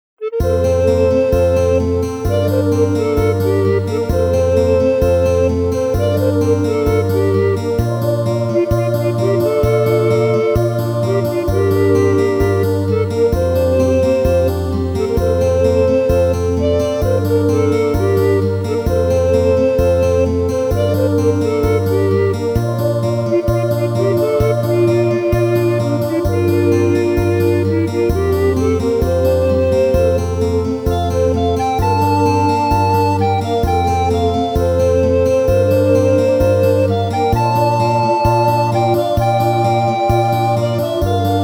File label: Chiptune